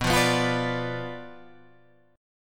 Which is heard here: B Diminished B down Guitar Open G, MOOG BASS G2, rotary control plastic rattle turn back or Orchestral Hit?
B Diminished B down Guitar Open G